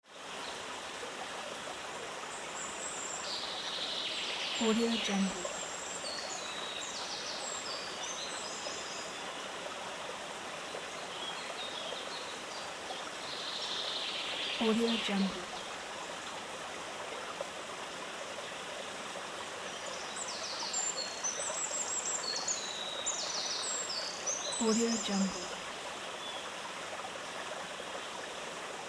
hot summer day in a suburban forest with nearby st; nature sounds; صدای یک روز تابستانی